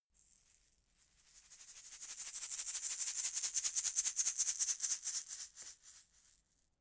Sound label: shaker plastic egg rattle dynamic movement